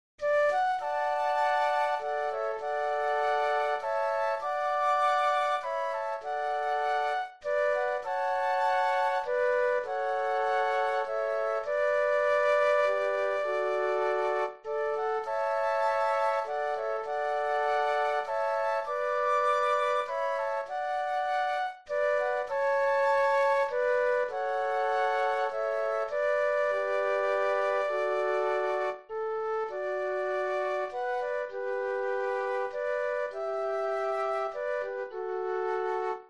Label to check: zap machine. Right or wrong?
Wrong